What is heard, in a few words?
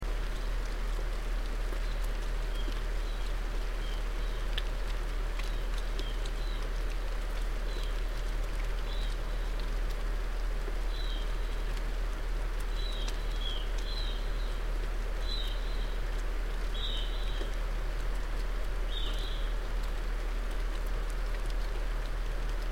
otter mystery